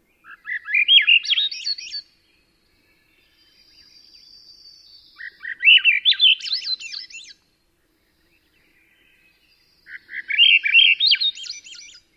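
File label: song, Swainson's Thrush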